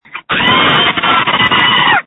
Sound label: Scream